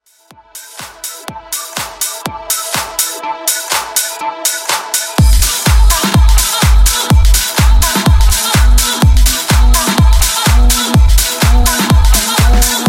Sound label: Future House 125 Bpm